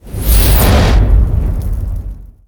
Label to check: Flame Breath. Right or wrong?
Right